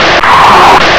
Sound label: zombie pain; svencoop